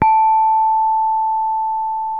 gangsa mid, gamelan; gravityharps

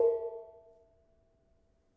Bonang Barung soft, gamelan; gravityharps; timbrebox

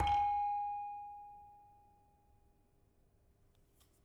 Saron wrong, gamelan

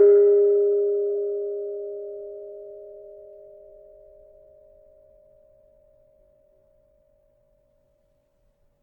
Keno G sharp 4, gravity harps tuned

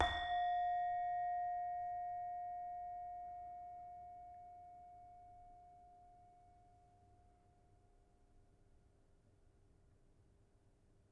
Saron soft, gamelan

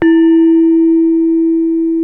gangsa lo, gamelan; gravityharps

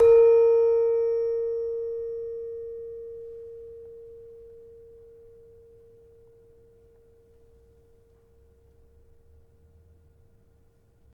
Demu A sharp 4, gravity harps tuned

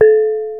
reyong lo, gamelan; gravityharps

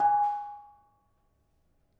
Bonang Panerus, gamelan

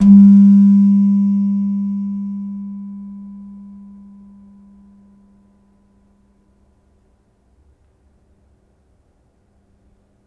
Slentem hard, gamelan

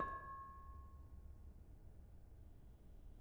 Bonang Panerus soft, gamelan

